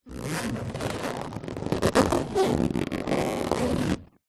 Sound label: balloon inflated handling squeaks; foley